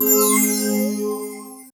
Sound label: heal; battle item